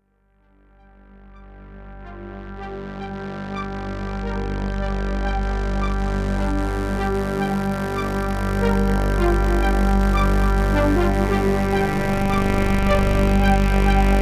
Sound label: Xenophone Hyper Synth Lead